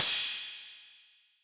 Ride, Dubstep One-Shot Drums

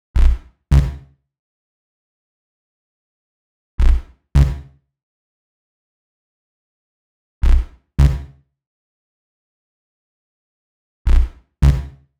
132 Em Bass, Bpm132, Complex Electro Synths

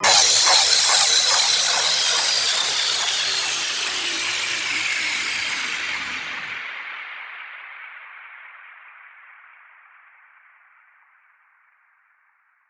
Crash, Dubstep One-Shot Drums

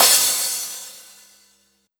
Crash, Cymbals; Mutekki Media; Vengeance Electroshock Vol.2

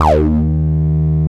303 Eb2; Jack Sparrow; Deep Dubstep Progressions

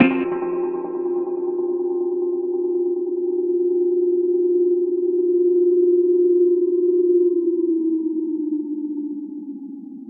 140 fx loop; Loopmasters; Jack Sparrow; Deep Dubstep Progressions; BONUS LOOPS

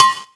Percussive; Percussion; Mutekki Media; Vengeance Electroshock Vol.2

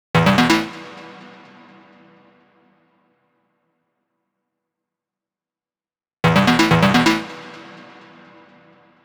128 Am Arp, Electro Synths; Prime Loops; Complex Electro Synths; Speakerbox

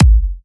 Bassdrum

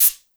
PERC; Loopmasters; The New Sound Of Dubstep; DKD DRUM HITS

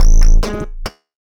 140 FX LOOPS; Loopmasters; The New Sound Of Dubstep

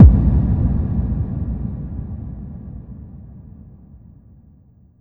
FX Impact

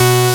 Synth Shot B; Mutekki Media; Vengeance Electroshock Vol.2

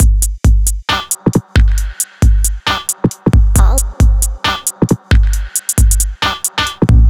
Bpm135 Break, dubstep drum loops; Esteban

